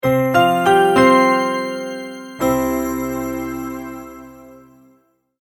multimedia logo, Musical Identity/Signature

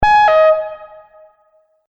Multimedia Event Notification, beep sound effect

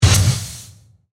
Hit Machine Air, Pressure; BlastWave

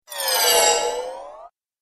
Wonky Wizard Spell; Magic Sound Effects; Andrew Fleming; Twistedtracks

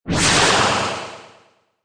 Magical Whoosh Delay Fast; Magic sound of casting spell, conjuring or wizardry. Witchcraft ritual summoning elemental or otherw; Whoosh Sound Effects

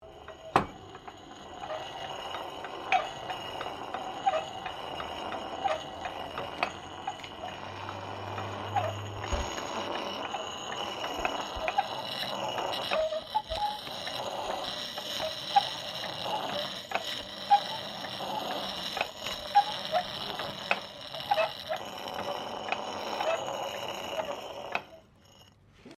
GRIND STONE MANUAL FOOT PEDAL SHARPENING AXE; Various old or antique devices; Machinery; Machines

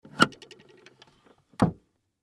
Record Player Lid Close Wood; Technology; Miscellaneous Electronic; Blastwave

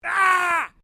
MALE SCREAM MID

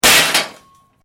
METAL CRASH; Materials

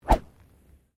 GOLF SWING PRACTISE; Various Golf sounds; Golf Sound Effects; Golf (Sound Effects:Sports)

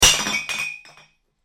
Bottle Plink, Glass; Materials; Crafted Signals

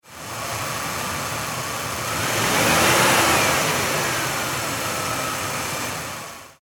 Car Mercury Sable Low Rev; Car 2002 Mercury Sable Low Rev; Power Car sounds; Car Sound Effects

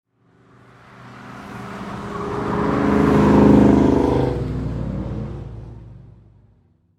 Car Race Car Pass By Slow; LYN110 package of Power Car sounds; LynneMusic

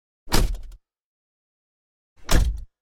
2 x door closes from inside car.; Cars; Vehicles; Car Door Slams

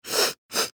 Human Female Sniff Twice; Sick Sound Effects; Sick (Sound Effects:Human)

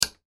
Switch Bathroom, Switch Light Off; Switch Toggle Mechanical; Household; Switches; Objects

